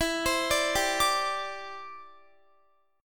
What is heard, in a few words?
E Minor 7th Sharp 5th, down, Guitar; Em7#5; arpeggiated